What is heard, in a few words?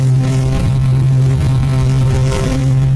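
bee engine; vehicles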